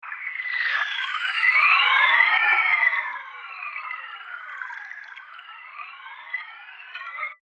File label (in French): canoë sonar